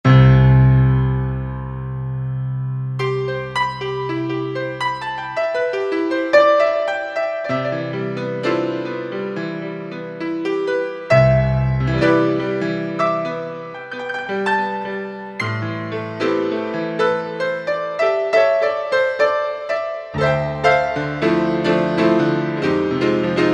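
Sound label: PIANO - GRAND; Best Service Gigapack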